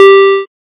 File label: safe; inside-house; safe puzzle